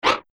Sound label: plane